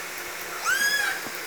call, L pod, SRKW orca; Alwin

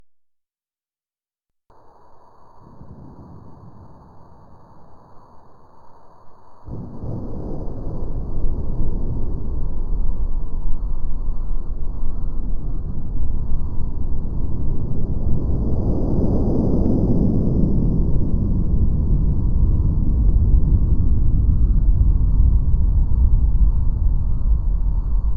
earthquake; ONC

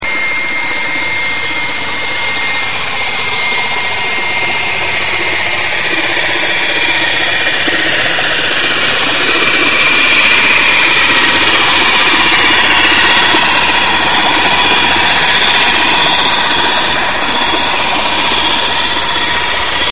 boat passing whiny; anthropogenic; salish sea data examples; signals

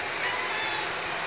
call, Orca; SRKW; Alwin

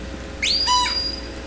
call, Orca; SRKW; Alwin; S13

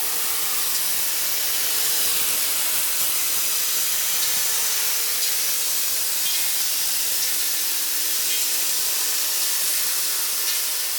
speedboat And Pings Detail; anthrophony; oikomi pipes

